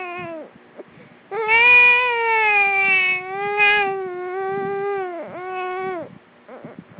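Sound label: baby tired, male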